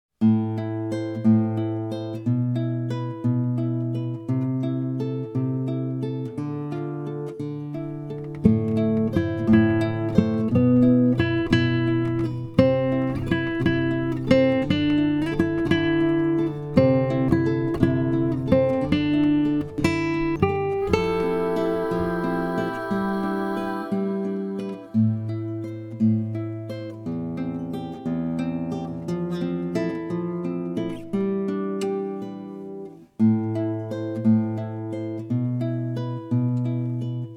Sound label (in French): accompagnement guide